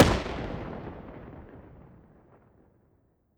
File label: grenade Detonate Dist; Weapon Package FortWars